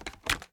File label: inspect